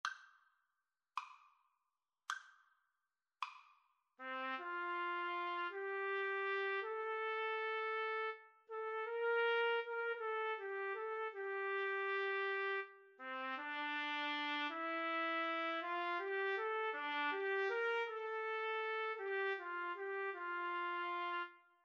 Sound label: Trumpet Duet